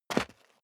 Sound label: step sneaker concrete grit single stair down; schritte